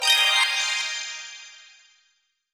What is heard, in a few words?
Turntable